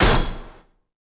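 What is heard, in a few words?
impact sword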